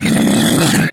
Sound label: snork agroed growl, mutants; STALKER; customnpcs